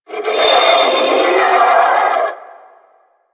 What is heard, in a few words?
Sentient Symbilyst Attack; Enemies; Barks